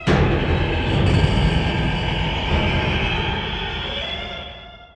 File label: squeaking